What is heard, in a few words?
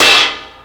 Ride Choke One-Shot, cymbal; Drum n Bass; One-Shots; KJ Sawka; Drum n Bass & Beyond; Drum Tool Box